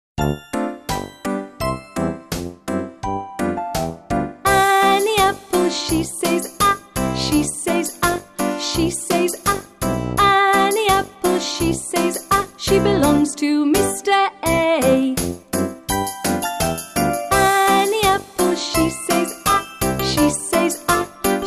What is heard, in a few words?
ALPHABET SONGS; ANNIE APPLE